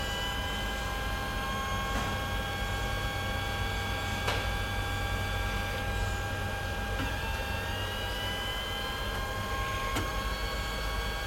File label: mpcnc idle